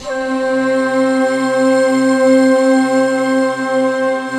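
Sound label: Japanese flute, Big Japanese Flute; Optical Media International; Sonic Images Library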